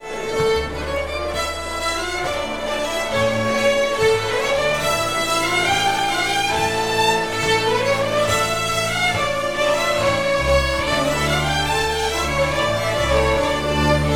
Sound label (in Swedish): schottis; fran transtrand